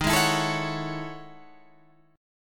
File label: E Major 13th chord